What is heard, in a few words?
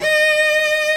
D#4 CELLO; SOLO; Instruments & Percussions; InVision Interactive; Lightware VOL-1